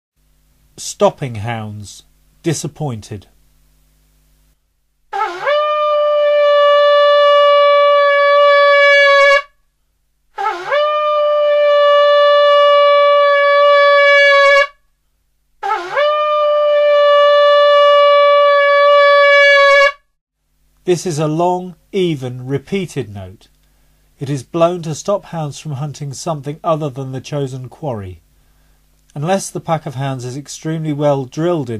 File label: STOPPING HOUNDS, calls